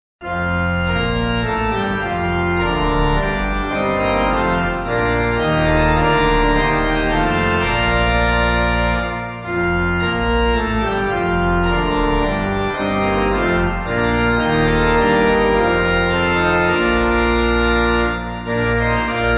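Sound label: Pipe Organ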